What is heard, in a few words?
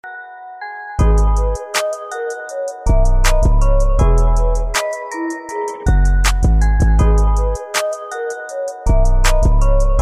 Creeping